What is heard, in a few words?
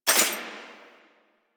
Mech, Rifle; Thanotech; Trumna Prime